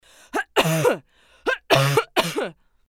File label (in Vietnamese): Am thanh tieng ho